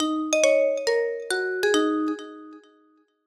sms tone ringtone; samsung note 8

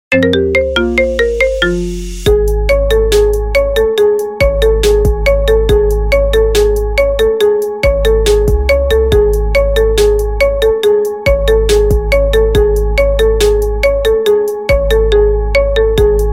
iphone marimba remix ringtone

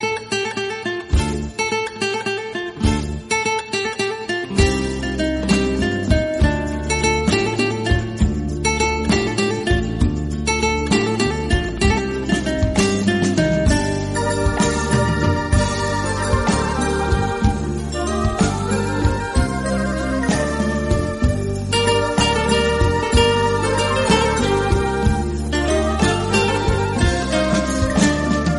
turkish hip hop ringtone

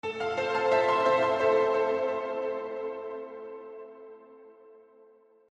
classy sms tone ringtone

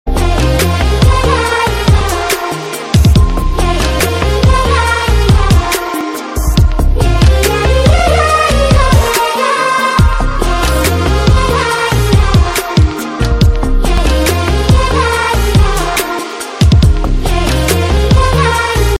tamil ringtone

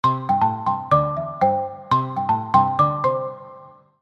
Samsung Galaxy race notification ringtone

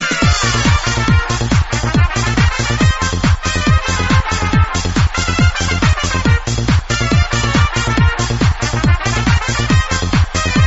mexican fun ringtone

